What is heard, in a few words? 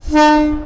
klaxon, Train; OpenBVE; 103KCJ-LowerCab